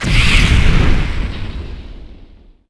Wind

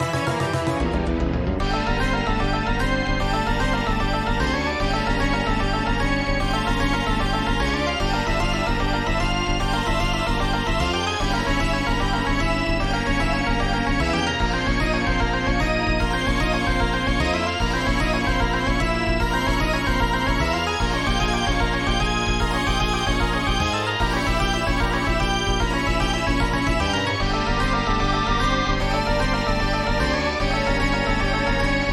Battle, bgm

